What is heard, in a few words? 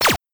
p atk punch 8bit